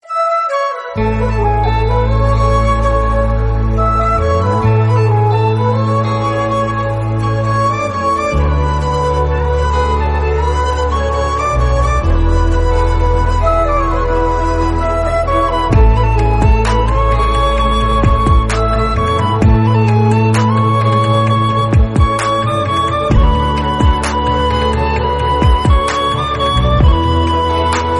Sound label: flute bgm; tamil; amma; valimai